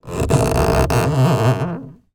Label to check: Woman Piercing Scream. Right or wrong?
Wrong